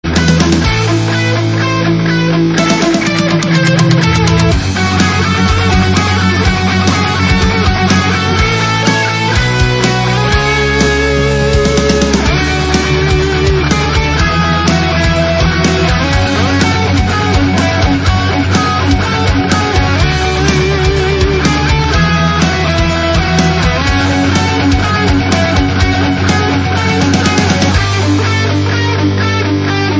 rock metal cover; The Great Giana Sisters

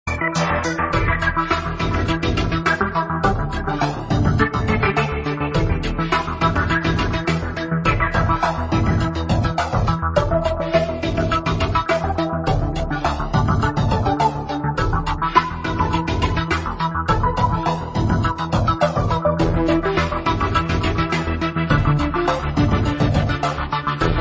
Synth Sample

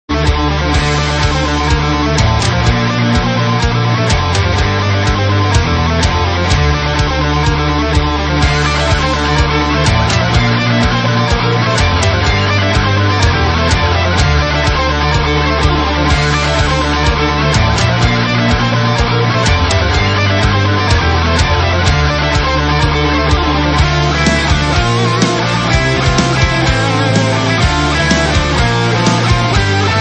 Rock Metal cover

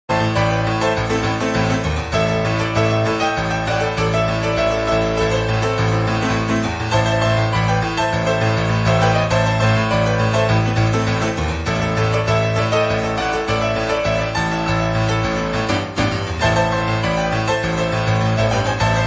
Piano cover; The Last V8

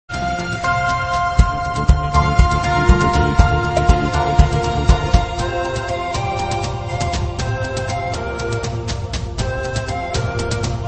Ambient Flight

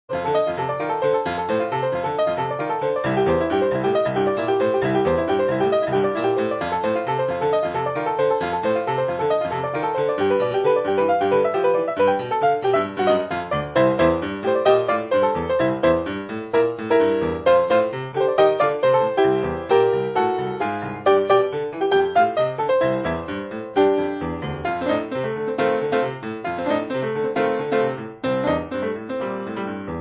Rock'n Wrestle (grand piano)

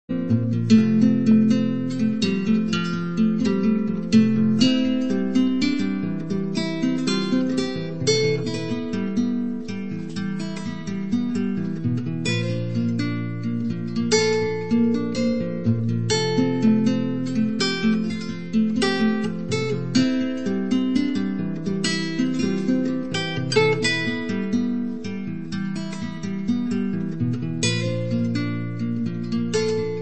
unplugged; Parallax

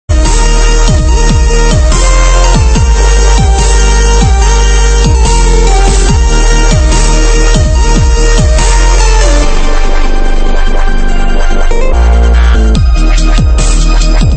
Dubstep RMX

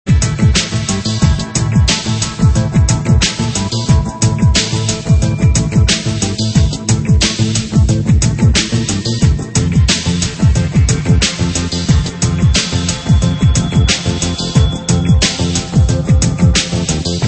tripbeats rmx

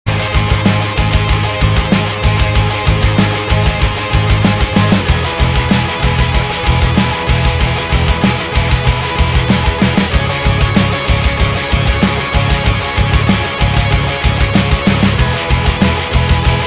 alt rock; Last Ninja 2 - The Sewers loader

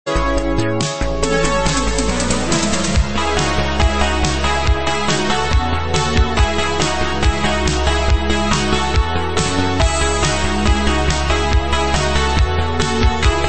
Double Dragon synthwave; Double Dragon City Slums

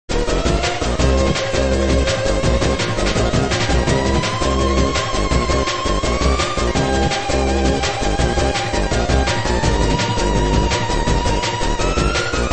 DrumFlopBass mix

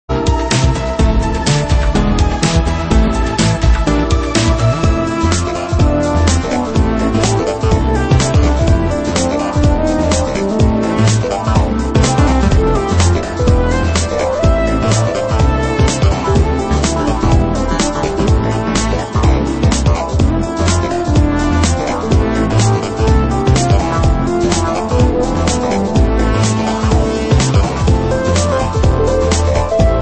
Acid Jazz

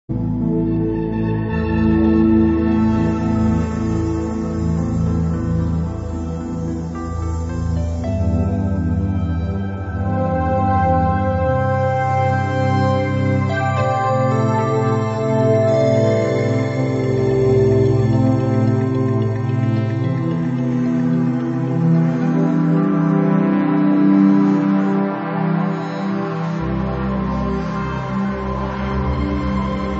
Synth

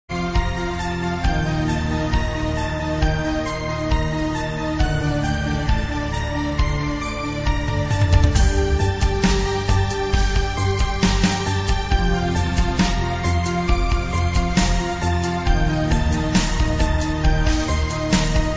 Orchestra; Giana Sisters

